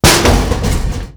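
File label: debris metal medium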